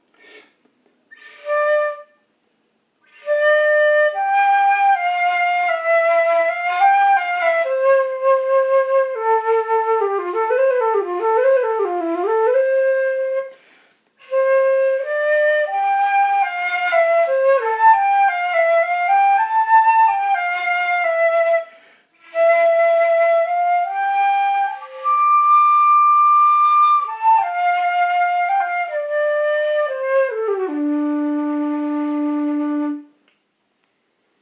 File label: Improvise, flutes